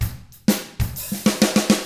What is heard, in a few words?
SHUFFLER, Drums